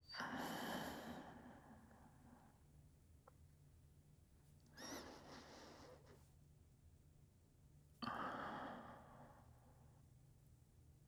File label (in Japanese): 寝息A, ASMR